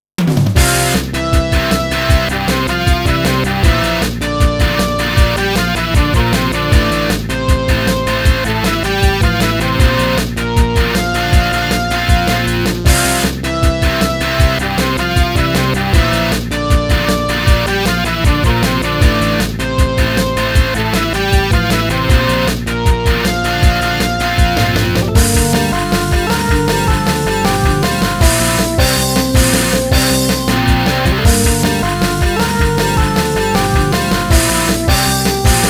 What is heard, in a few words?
Pops Beat; SNK Arcade Sound Digital Collection Vol. 22